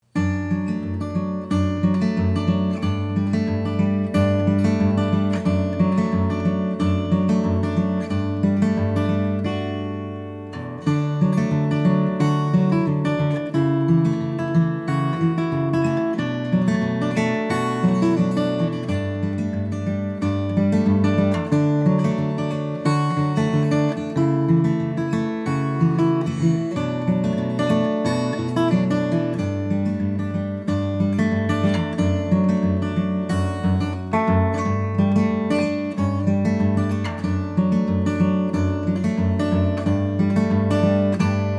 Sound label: solo guitar, Jazz Guitar